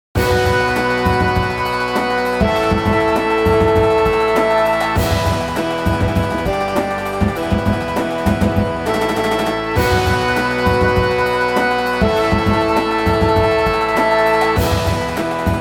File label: rumble band